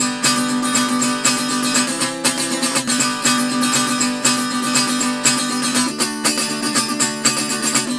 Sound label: Tenor Guitar, Appalachian